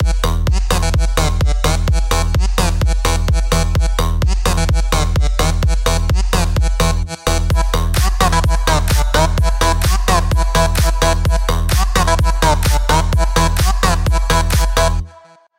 Construction Kit E Loop Full

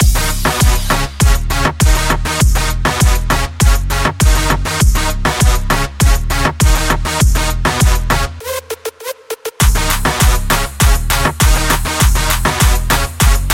Full Loop

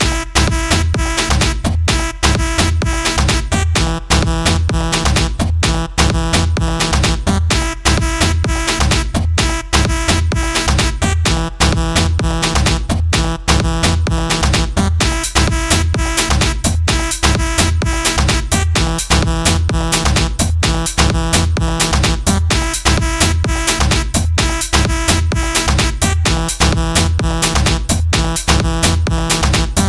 Full Loop E

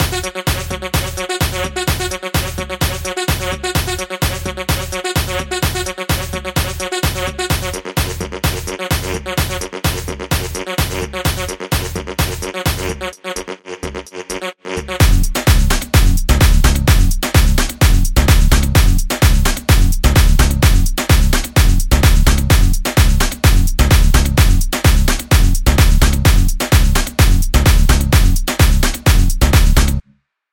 Kit Full Loop Fs

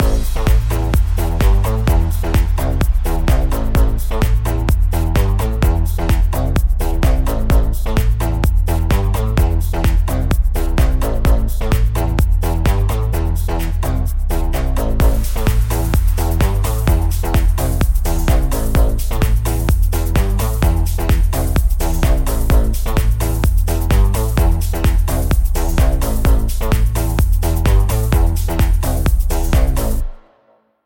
Construction Kit A Loop Full

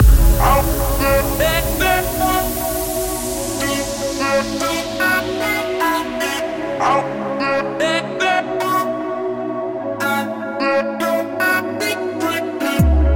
Kit Full Loop

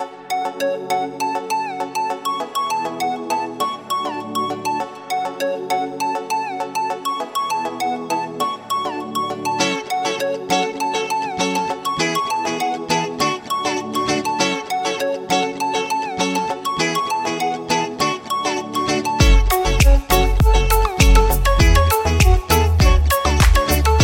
Kit Full Loop Fs

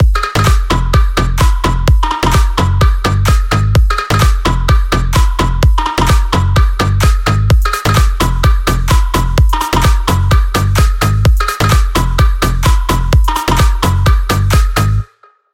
Construction Kit Gs Loop Full

